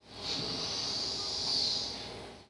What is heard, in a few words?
Air, Train; H-Start 415 Stadler Flirt